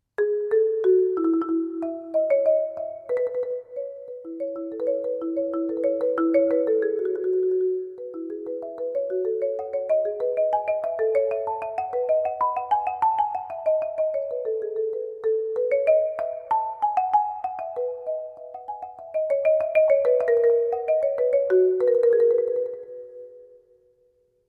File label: keyboard